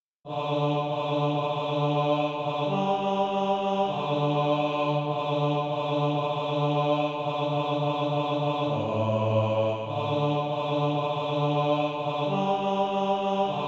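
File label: bass, Practise Music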